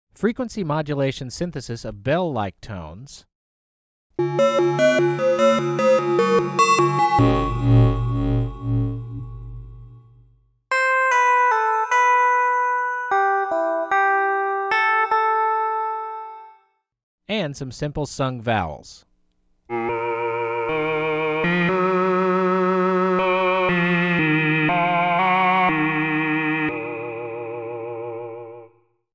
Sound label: FM Bell Tones and Vowels